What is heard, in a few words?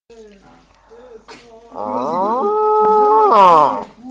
muuu